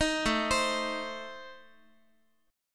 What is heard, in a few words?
A Diminished A, Guitar; 18,17,15,x,x,x; 4A,4E,5A,5D,5G#,6C#; arpeggiated; JGuitar